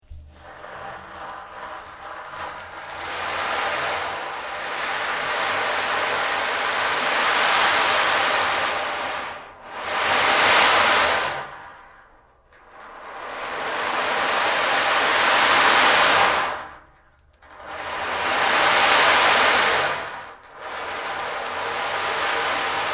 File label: Woodpecker Rain